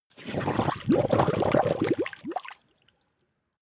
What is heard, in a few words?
liquid slurp large